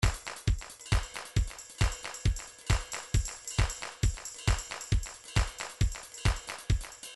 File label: trance midi loops beats; newtronic trance constuction kit